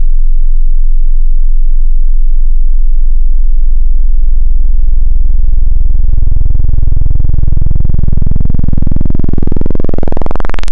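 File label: Sin Tri, wavetable; Synthetic's Serum Collection; AngelicVibes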